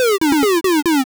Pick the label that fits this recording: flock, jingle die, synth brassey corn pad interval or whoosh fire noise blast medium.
jingle die